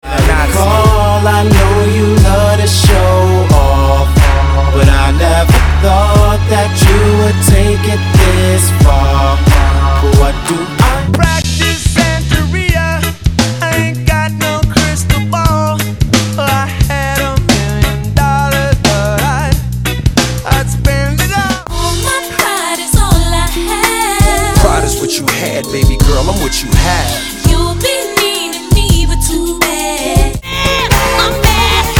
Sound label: music mix example